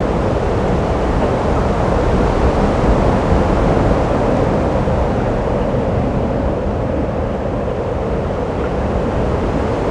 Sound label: cave wind; avant gardens